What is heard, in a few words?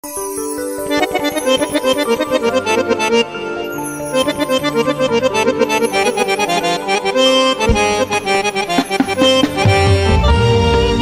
Accordion